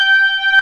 TINE STRINGS

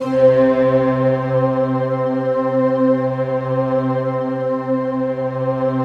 sky choir, Random Sky; Optical Media International; Sonic Images Library

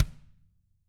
TAMA HRD, Drums; ILIO; Double Platinum Drums 1; TAMA+TAMA

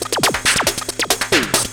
drm 138, Techno, drum-loops

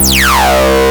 SQR DIST, Synthy Tonal; Distored Reality

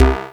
bass; Stereo

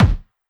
BD; Best Service; Dance Mega Drums

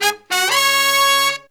Pop & Funk Brass, 096

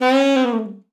sax c#4, Saxophone slides; Best Service ProSamples vol.42

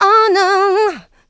Vocals, Adlibs; Houseworx

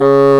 Orchestral Winds Bassoon

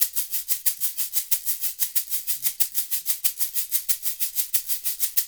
LOOP, SHAKERS

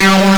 TB LEAD; Soundengine - CSR-000 The Art of Transwaves

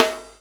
SD, Dance Mega Drums; Best Service

